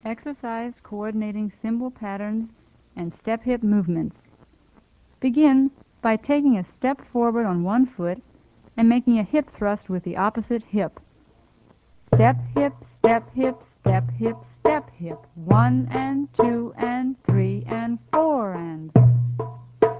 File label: Cymbal Practice Music